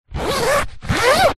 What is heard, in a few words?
laptop case fabric pouch zipper; zapsplat